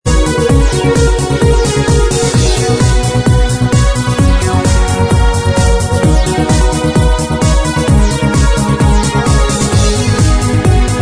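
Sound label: dance remix instrumental track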